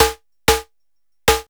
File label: LOOP, DRUMS & PERC